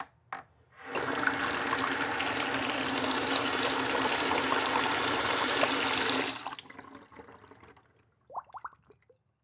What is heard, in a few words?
Faucet Bathroom Sink Faucet Turn On High Shut Off Short; household